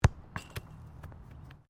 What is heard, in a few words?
SOCCER BALL INTO WIRE NET; Soccer ball sounds; Sports